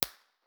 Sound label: koli snow, koli national park winter; 1 way mono